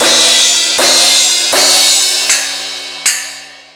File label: Rock N Roll, E-MU Pro-Cussion